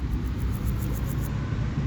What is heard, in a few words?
Pseudochorthippus parallelus